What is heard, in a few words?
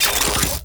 Charge Up; Warframe; Corpus; Spear Gun; BE Ferrox